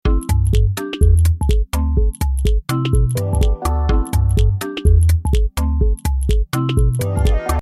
909 techno

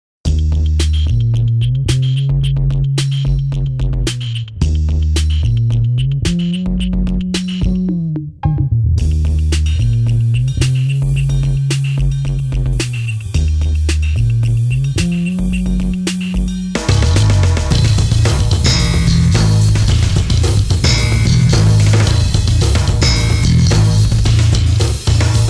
bass; eq 20 tone demo

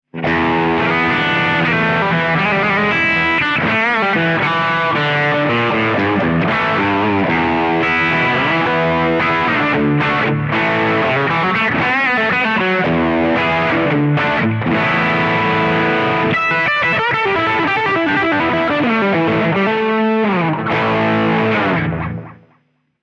classic stack

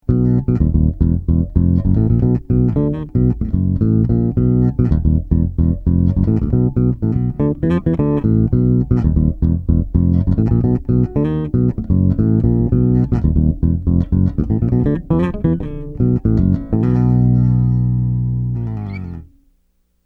octave bass

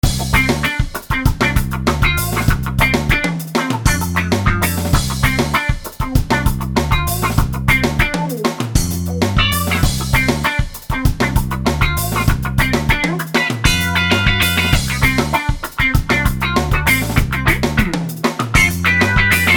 sound check

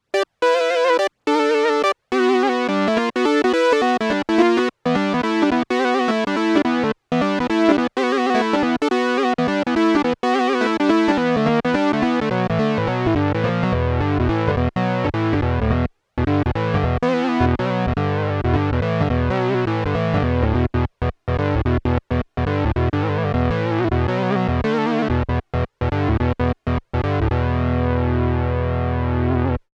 Fat Fifth

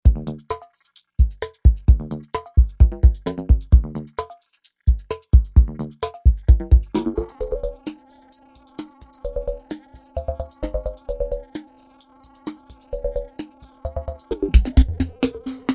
v synth xt analogic kit

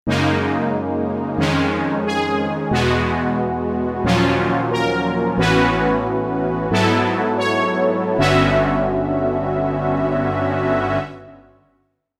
synbrass sfz; Juno-Gi

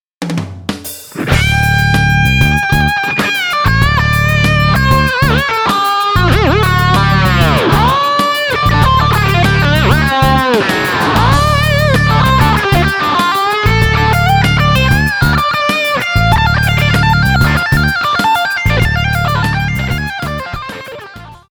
patch demo

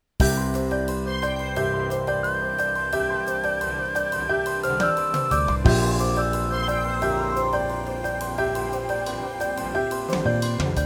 rd 700sx everything cool

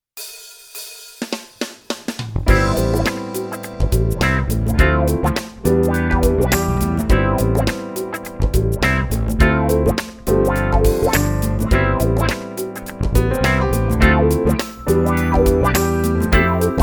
clean wah

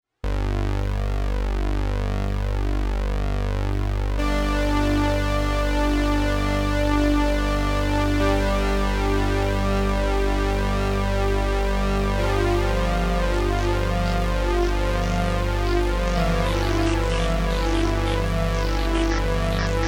Pulser

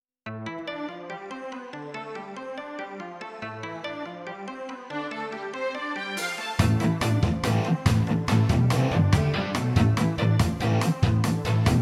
rock

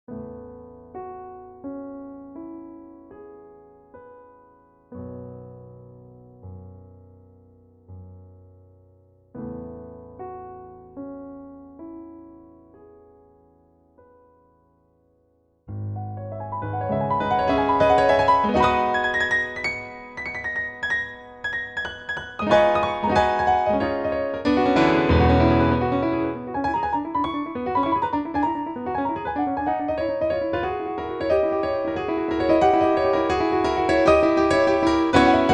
piano; HP-203

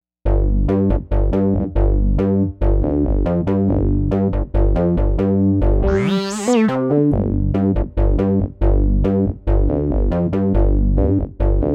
reso bump; Jupiter-80

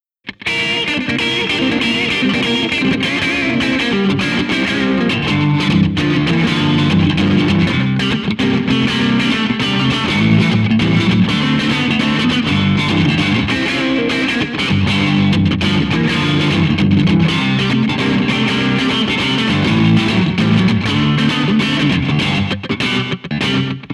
Rockabilly

